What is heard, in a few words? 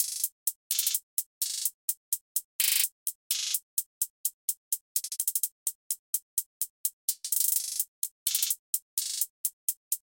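Hats 127 bpm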